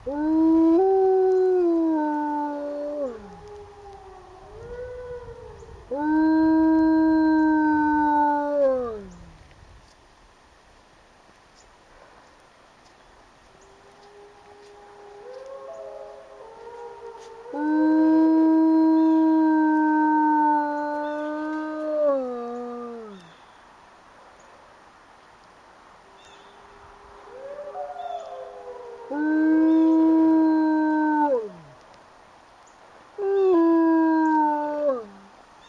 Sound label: Wolf Howl